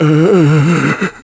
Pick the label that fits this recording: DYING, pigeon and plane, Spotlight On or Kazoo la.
DYING